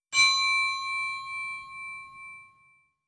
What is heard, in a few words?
button ping; SGI O2 Out of Box Experience 2.2; cyber anatomy